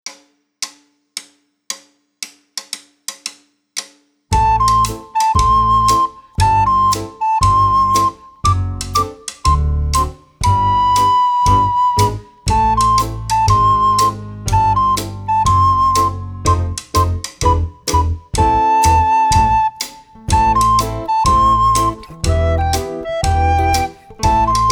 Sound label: Ragtime